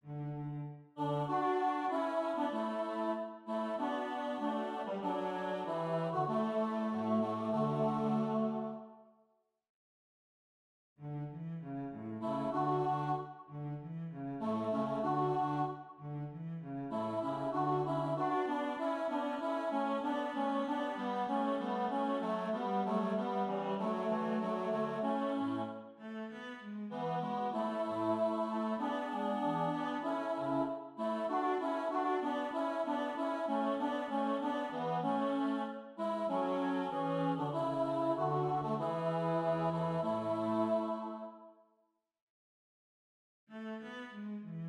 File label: Tenor